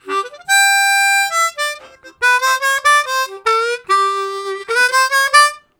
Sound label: Laddered, Harmonica, Riffs; Zero G